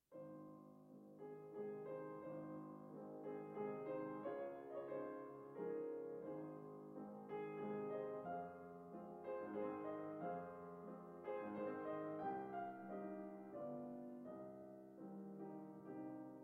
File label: Piano; Brahms Waltz Op 39 No 15